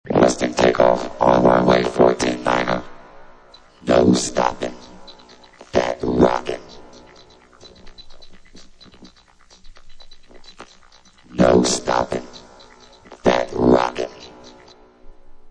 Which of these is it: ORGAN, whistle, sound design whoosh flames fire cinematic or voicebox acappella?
voicebox acappella